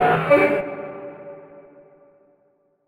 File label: Transition Hit Impact Warble; Production Element